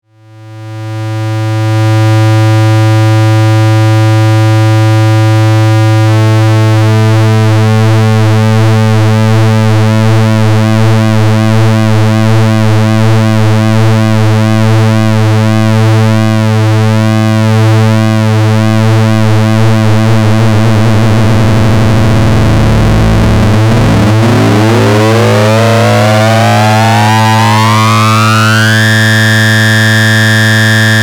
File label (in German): Pulswelle LFO Modulation